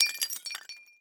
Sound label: cup break, tea